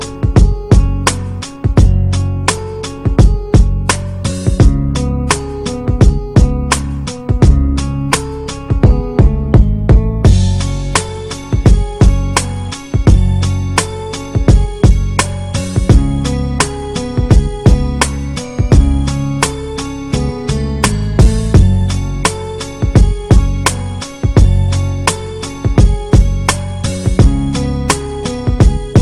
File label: dream tone